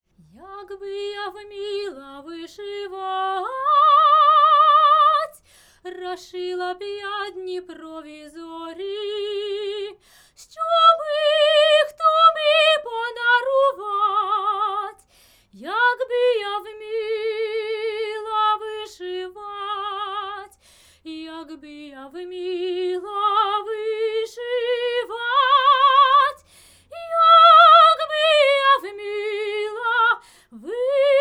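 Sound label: domra, Neumann TLM170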